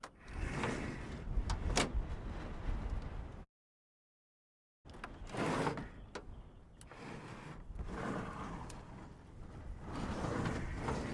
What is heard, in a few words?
mitsubishi zero var canopy; mkh418; Sonniss GDC